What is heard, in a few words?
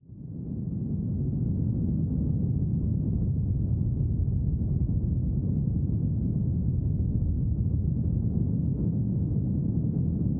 nuclear winter